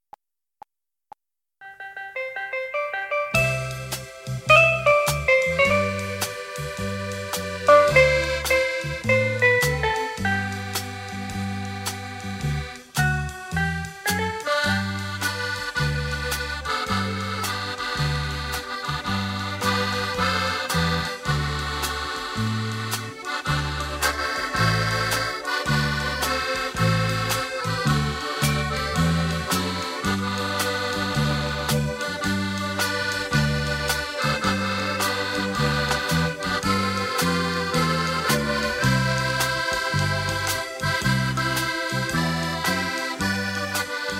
Accordion Medley, G; backing track

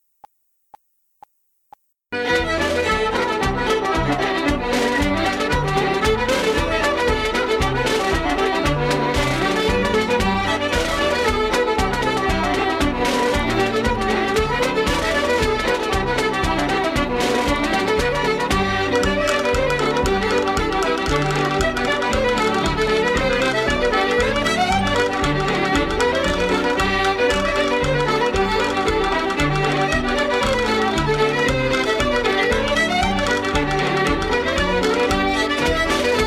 Traditional Irish Reels Medley G; backing track

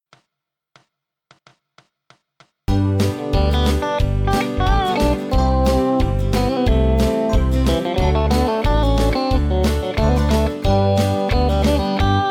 G, backing tracks irish country pop

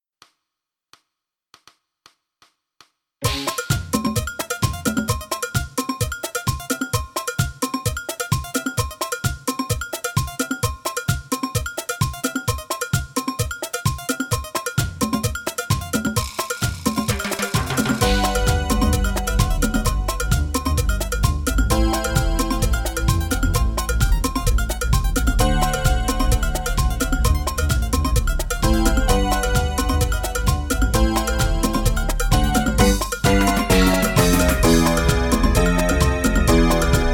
Ballroom Medley Samba G, backing track

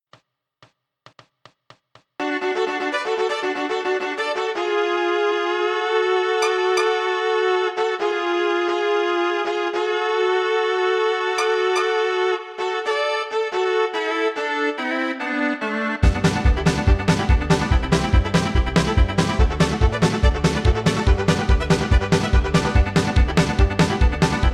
Bluegrass Banjo fiddle, G

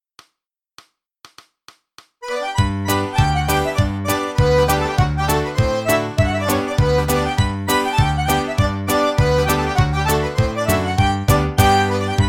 Traditional Irish Polka G

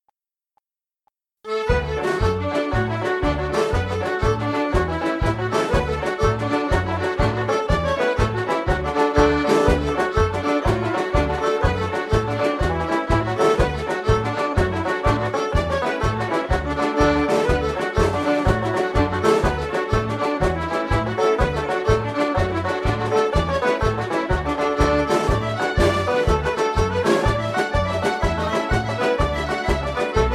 Traditional Irish D, backing track

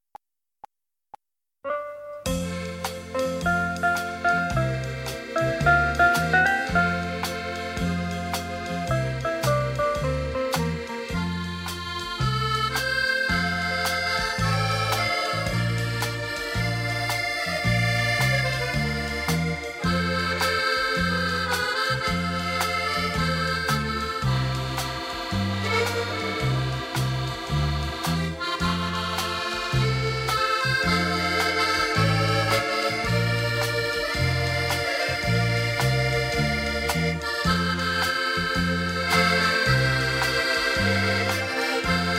Accordion F; backing track

